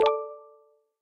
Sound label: staged shot complete